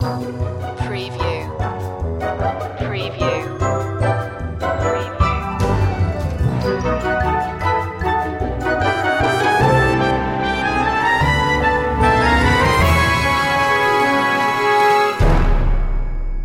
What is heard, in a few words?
nostalgia tv